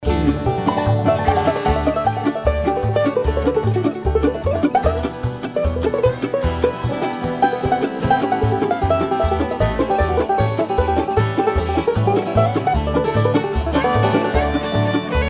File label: banjo solo